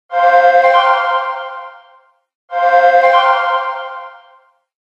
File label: Ring Whistler